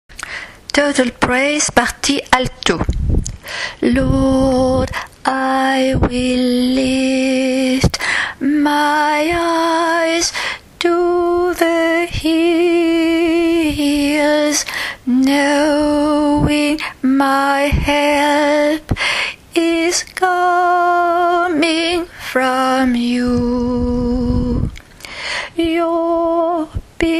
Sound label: altos